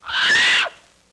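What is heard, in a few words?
Macaw Screech Agitated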